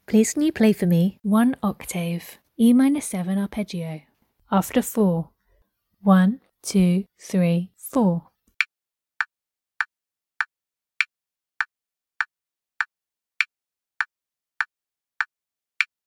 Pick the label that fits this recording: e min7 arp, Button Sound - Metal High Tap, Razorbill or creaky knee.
e min7 arp